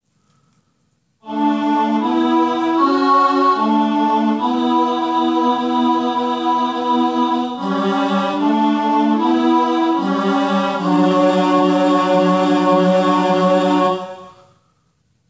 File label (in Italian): alleluia iniziale tenori